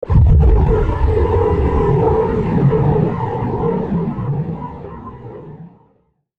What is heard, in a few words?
Hit Impact Science Fiction; Blastwave